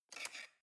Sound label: knife wood drawer handle slide